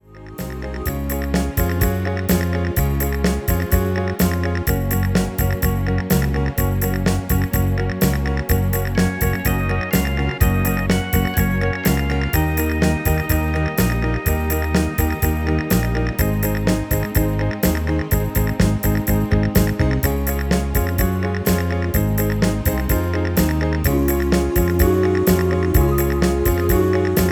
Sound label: MIDI